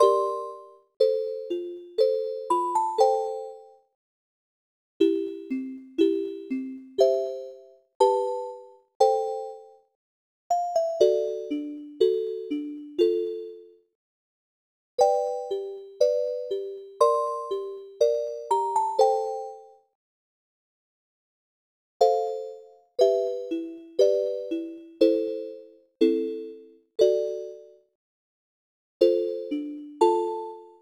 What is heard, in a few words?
BGM FIELD RAIN; Tongari Boushi to Mahou no 365 Nichi; Enchanted Folk and the School of Wizardry; NDS